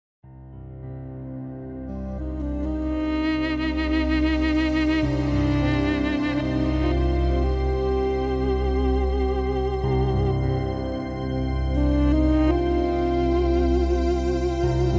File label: Romantic Piano; Night Moods